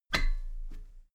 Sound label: step metal ladder step top soft; schritte